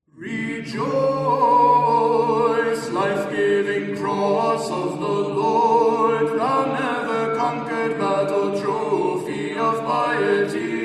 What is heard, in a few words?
Rejoice, Life-giving Cross (Byzantine Chant, Tone 5, Special Melody 'Rejoice')